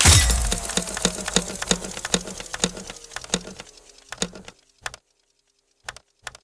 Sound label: splash stop spin; wildworlds